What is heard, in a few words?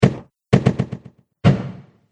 Impacts Dry Delay Reverb; Hit Sound Effects